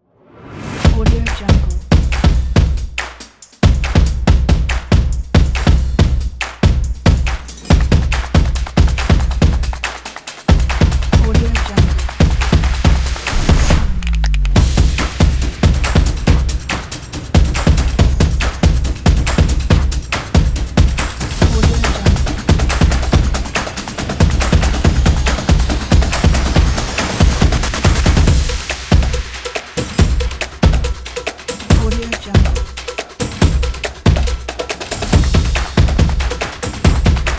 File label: activity energetic